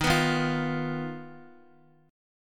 D sharp Suspended 2nd Sharp 5th D sharp, Guitar, chord; strummed; JGuitar